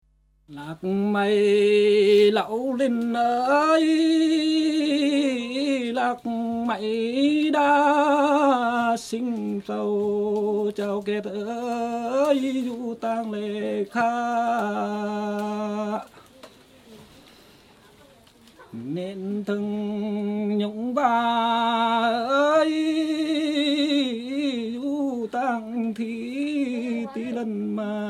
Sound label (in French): Chant solo